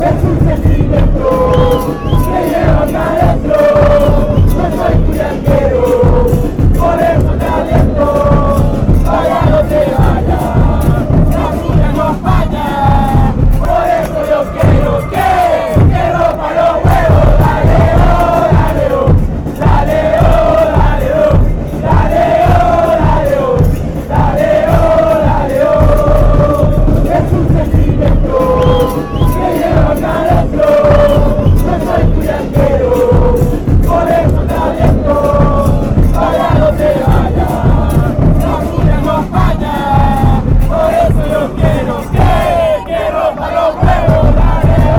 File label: chant, fanchants